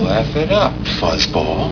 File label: laugh up; star wars